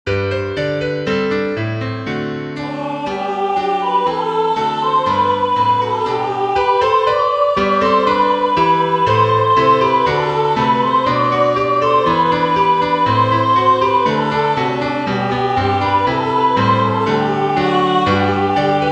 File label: piano, folk carol, for intermediate piano; On Christmas night; Vocal melody